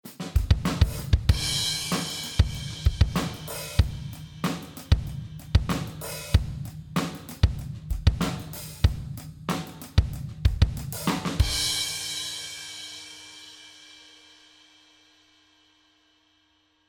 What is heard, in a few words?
BFD3 One Kit Old School OH; fxpansion